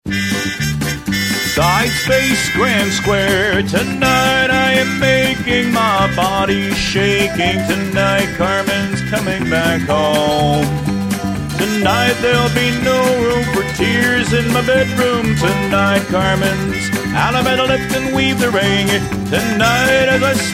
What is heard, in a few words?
vocal